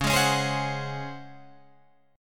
C sharp Major 7th Suspended 4th C sharp Guitar Drop D; 11,11,11,13,13,14